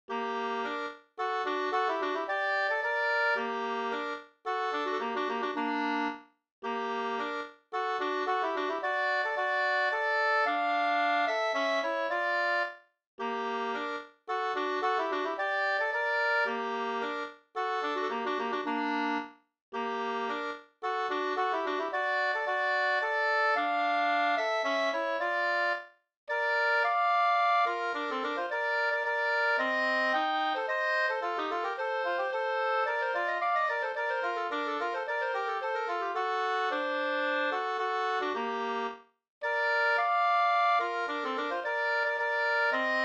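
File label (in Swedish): polska, tvåstämmig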